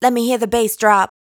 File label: Bass Drop, adlib, Dry Vocals; Raw Vocals; Slate Digital Omnivox Vocal Sample Pack; Adlibs